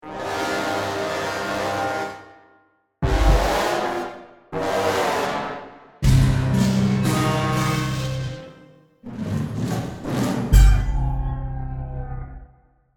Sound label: ORCH BINAURAL, 3D instruments; Dolby Atmos/Ambisonic; 3D instruments for live immersive performance and studio production; Spat Curve; NAFF - 3D Instruments; NKS